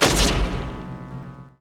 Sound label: drop To hole; wob2 demo